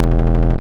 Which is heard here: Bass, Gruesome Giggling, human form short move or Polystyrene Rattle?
Bass